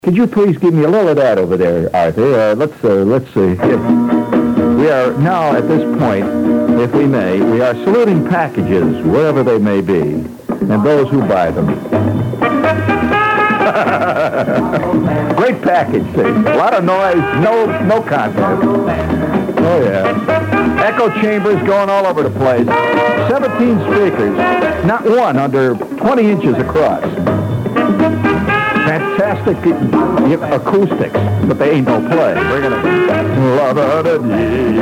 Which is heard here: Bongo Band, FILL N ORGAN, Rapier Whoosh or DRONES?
Bongo Band